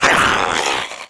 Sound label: Demigods Growl; Playable Tutorial Level